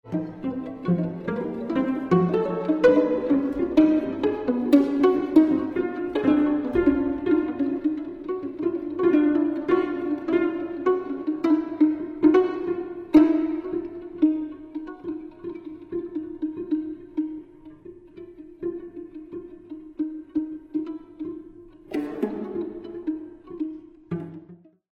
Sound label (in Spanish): cuarteto de cuerda; Witold Lutosławski